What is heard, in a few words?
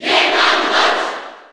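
Cheer Japanese; Mr. Game & Watch; SSB4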